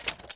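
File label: Armour Movement; Civ4 warlords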